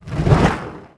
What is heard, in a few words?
Generic Plasma Fire; Playable Tutorial Level